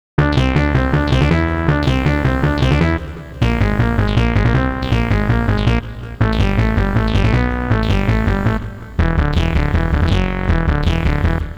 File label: seq mono; the meditation soundset; krishna is back 2023